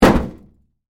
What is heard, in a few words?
Auto Hood Sledge, Impact, Hammer; Crash Sound Effects; BlastWave